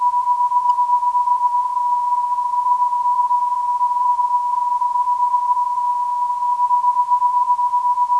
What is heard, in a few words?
MAR day time 383kHz